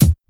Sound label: rich highs steel kick drum sound e key